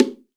BONGO, STEREO BONGO; Cuba

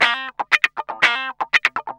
GUI, LICKS; 120 LICKS

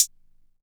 Hat, Drum & Bass

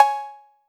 cowbell, Roland TR-808

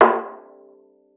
VIOLA FINGER; Xsample VOL-5

